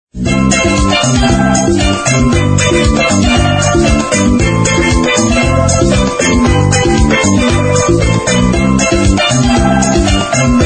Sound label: Ringtone